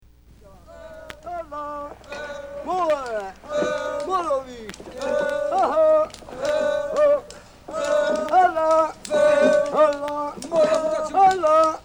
marble workers' songs (lamento dei cavatori di marmo)